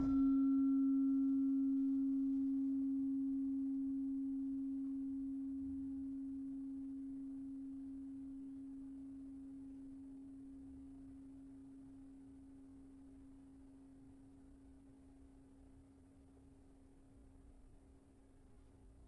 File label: Slentem C sharp 4, gravity harps tuned